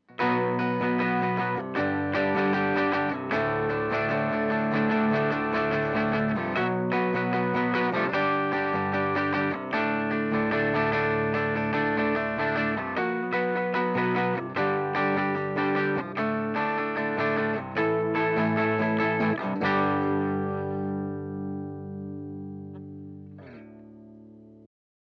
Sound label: clean ibanez arz emg